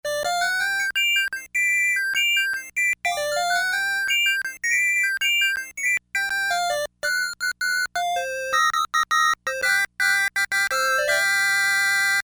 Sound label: Organ 2', Crumar Trilogy